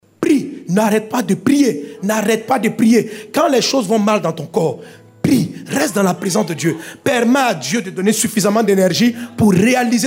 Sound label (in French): REVEIL SON